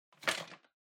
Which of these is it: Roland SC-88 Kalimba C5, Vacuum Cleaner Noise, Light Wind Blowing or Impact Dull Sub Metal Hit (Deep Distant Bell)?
Vacuum Cleaner Noise